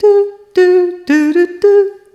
Rhythmic G

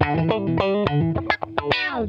Funk Guitar; 90 s Sample CDs; Zero G

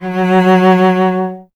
STR VC F#0, Cello; Miroslav Vitous; Solo Instruments VOL-1

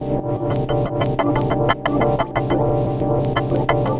trains; Polyend; Loops n trouble

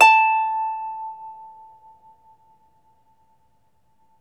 Troubador Harp

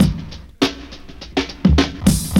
Fills, Roland; Sound & Vision - Gigapack I; Perc

